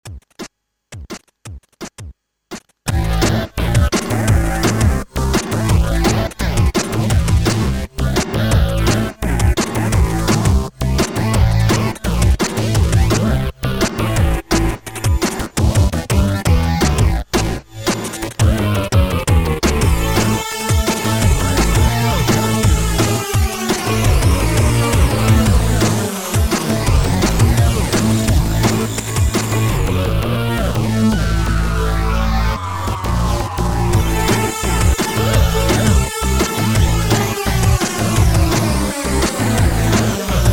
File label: midibox sid; A demonstration of the new wavetable feature which allows to create sequences of waveforms and CC controllers for more percussive sounds